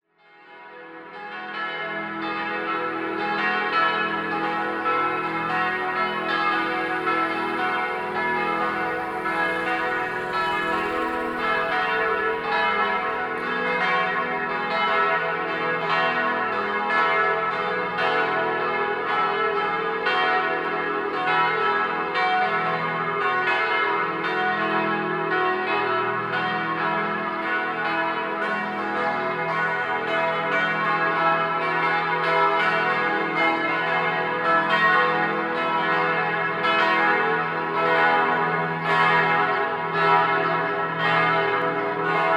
graz mariahilfer glocken auf platz

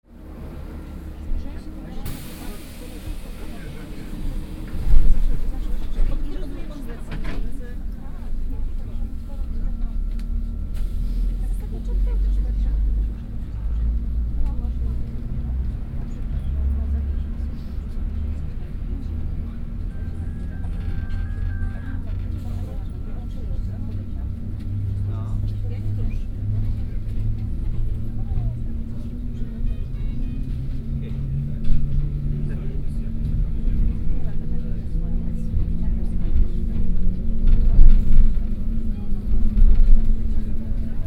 zugfahrt

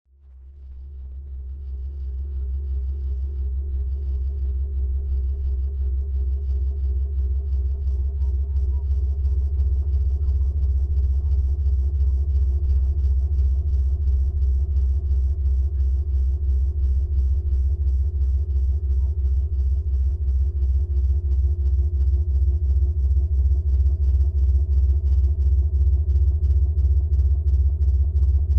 croatia baum singen

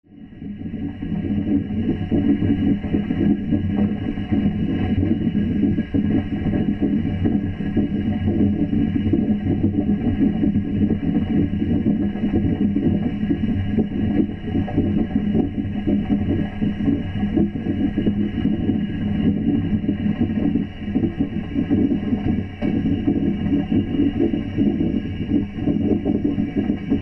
zug waschbecken

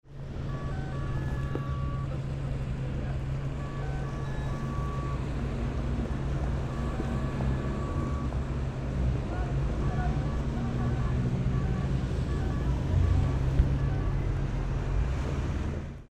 gdinia ampel

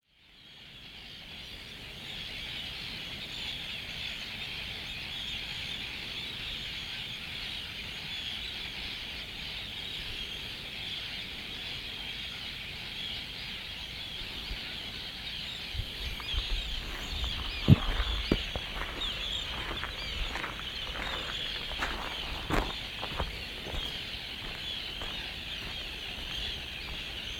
arnarstapi voegel

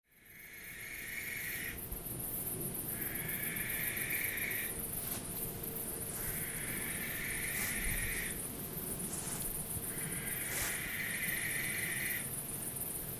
schleier eule

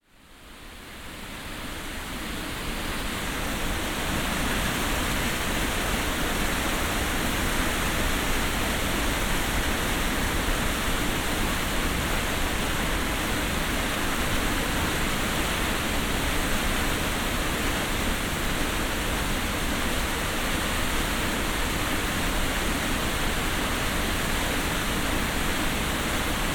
wasserfall dynjandi unten

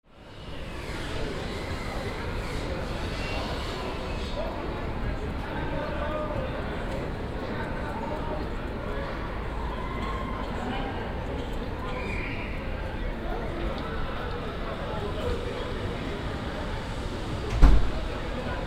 lissabon fenster hostel

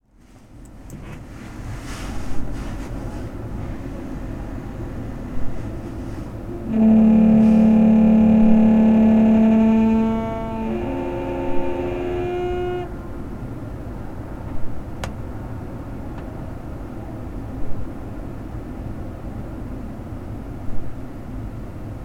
ungarischer nachtzug

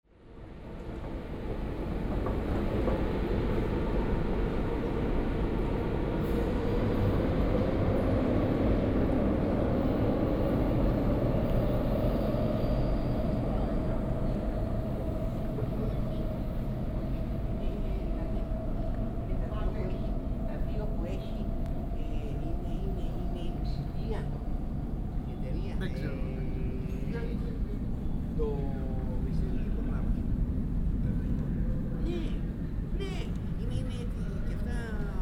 ubahn station leute reden

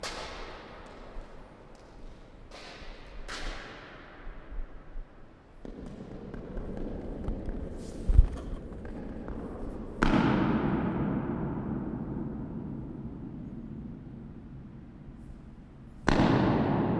djupavik oeltank

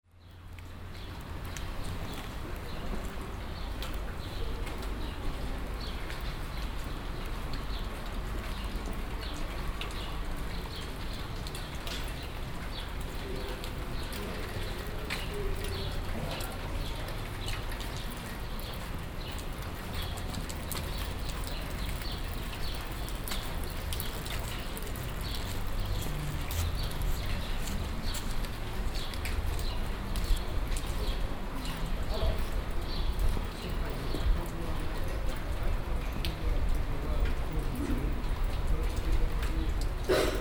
gdansk strasse regen

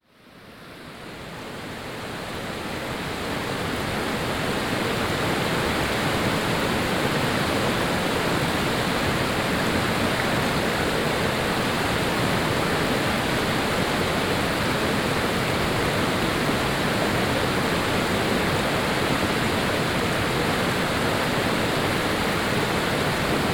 fluss; fluss-seljavellir

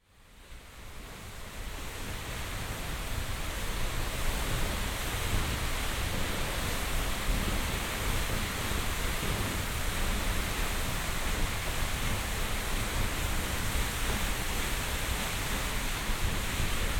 wasserfall seljalandsfoss hinten

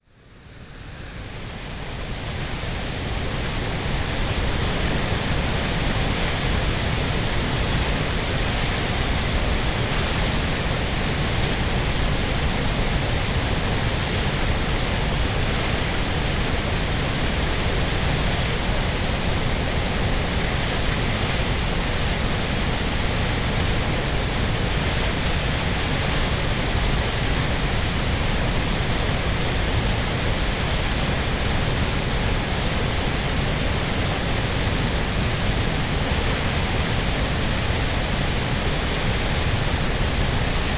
wasserfall oexararfoss

